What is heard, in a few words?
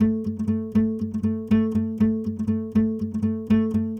loop guit